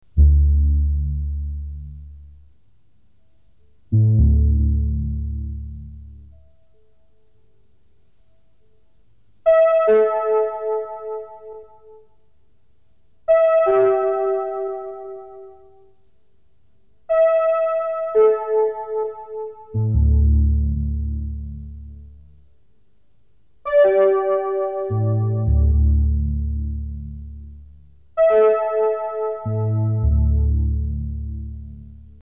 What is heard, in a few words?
water moonlight ripples and juno6ver